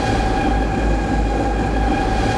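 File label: Engine loop; vehicles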